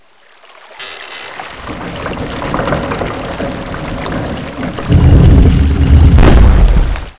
water puzzle win; SecretsJ DEMO

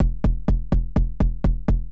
kick, house, 125, drum; eJay

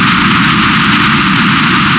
water machine; PC Gamer IT CD 41; Daikatana

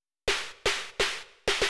SNARE HOUSE 125; eJay

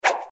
Ice Ball Throwed; bob espo

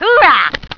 Soldier Noise; BOOM

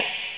drums china; Atari Mega Archive

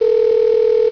RING OUT; iview

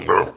boar attack; monster

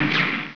new play laser; Vitamin

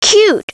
cute

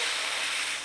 panel in; The Rift

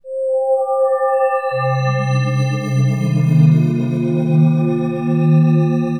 synth, Drum+Bass, 160, C; Keys

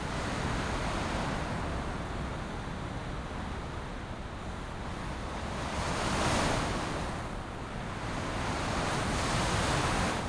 env wind

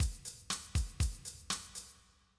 ballad

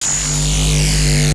magic; duke nukem total meltdown

